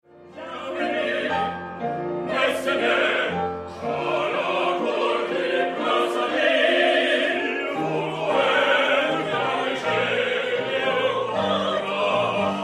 choeur final

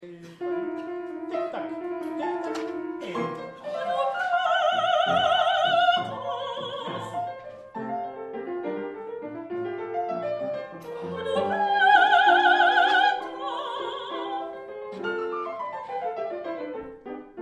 la princesse soprano

